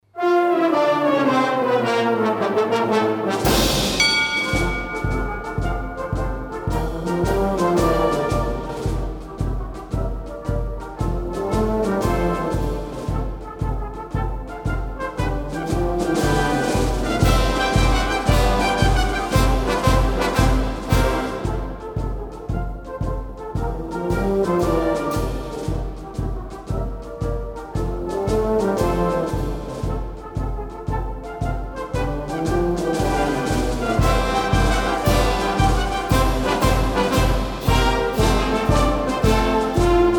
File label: Liberty Bell, brass